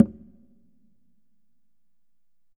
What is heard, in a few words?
Congas Wet; Drums Percussion